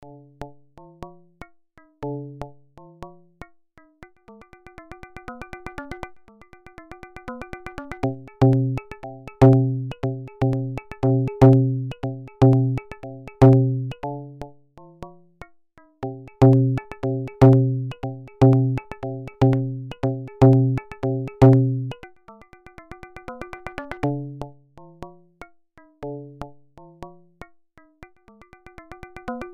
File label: hand drums Bell Wah A